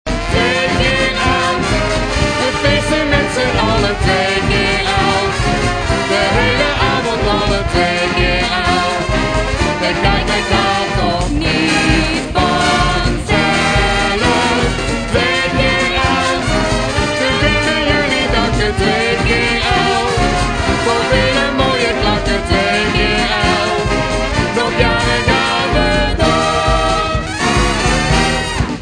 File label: Samba